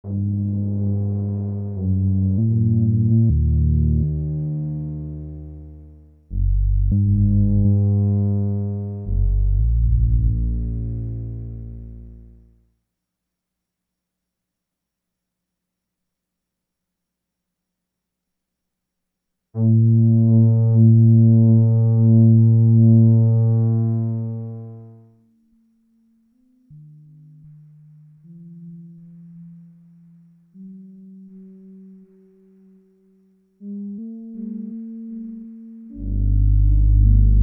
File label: Mother 32 Improvisation; Storm Clouds and Green Rain